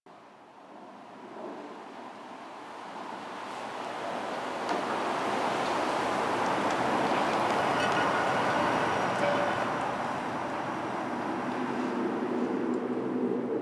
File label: Winter Exterior Medium Bed Loop; Nineteen Ninety Nine